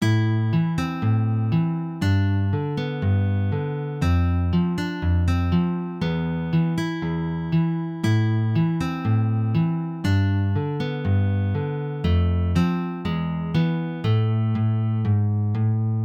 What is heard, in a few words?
Fingertwist in Moll, picking